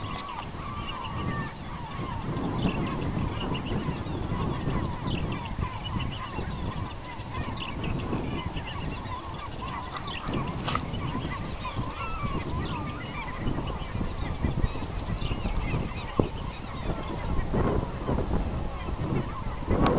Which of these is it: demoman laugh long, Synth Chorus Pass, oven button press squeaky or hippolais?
hippolais